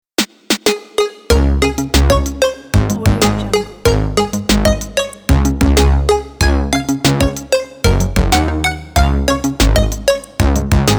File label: 8 bit happy birthday